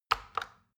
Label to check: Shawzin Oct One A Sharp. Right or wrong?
Wrong